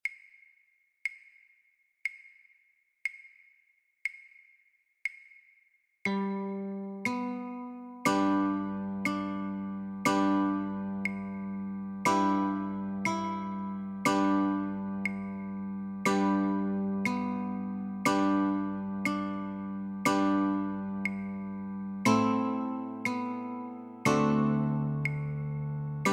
Notes and Chords, guitar

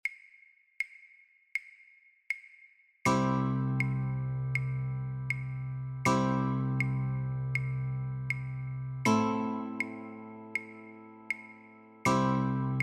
Chords, guitar; christmas

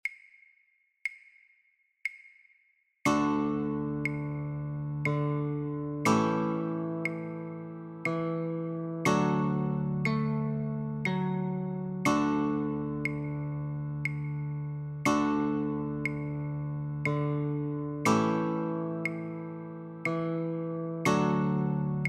Notes and Chords, guitar

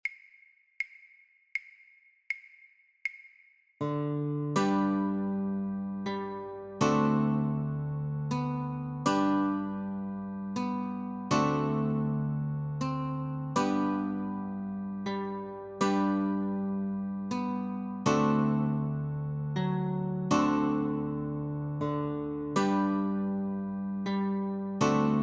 Notes & Chords, Guitar; christmas

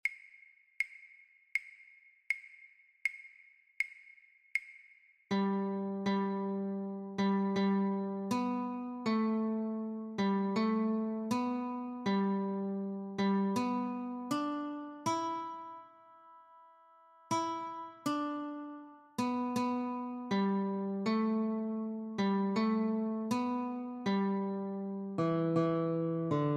Auld Lang Syne - Notes, guitar; christmas

